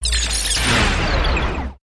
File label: Complex Space Laser Zap